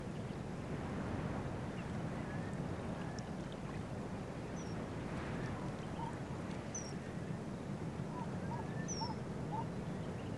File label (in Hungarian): jégmadár hangja